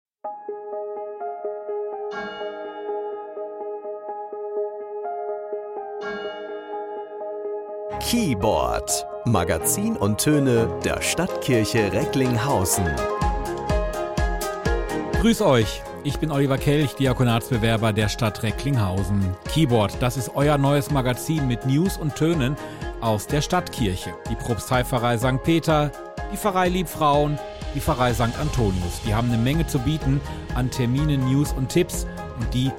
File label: Keyboard Trailer